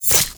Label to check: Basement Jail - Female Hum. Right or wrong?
Wrong